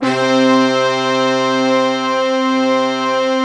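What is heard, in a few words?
brass tutti, Brass Tutti octave; Optical Media International - Sonic Images Library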